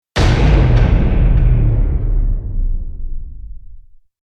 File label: Hit Downer; Ninja Tracks